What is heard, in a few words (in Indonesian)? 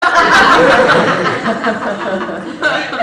ketawa; bajuri